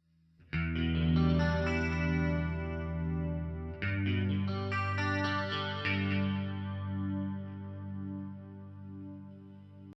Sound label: clean